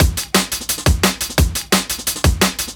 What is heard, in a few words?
DNB BREAK